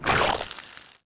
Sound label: meat Hit; weapons